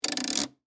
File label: Television Set, Technology, Television, Set, Change, Uhf, Channel, Black, And, White, Tv; BlastWave